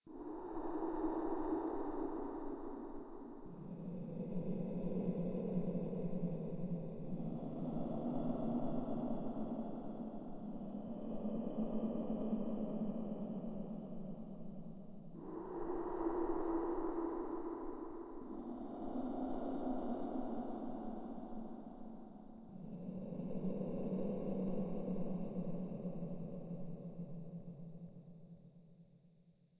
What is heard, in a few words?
wind